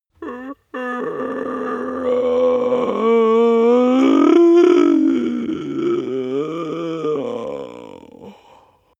male growl exhale whine; menschen; koerpergeraeusche; soundpool

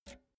pull out jack multi socket; objekte; elektronik

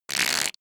creature quack short; kreaturen

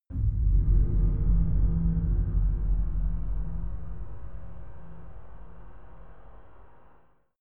scary metal chime

